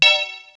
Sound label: Tubular Bell, Korg T3; Drum Samples